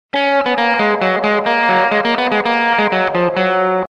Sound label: deck the halls SMS